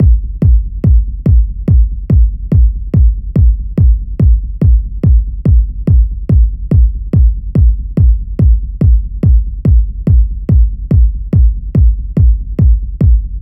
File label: Kick Loop 143BPM, groove techno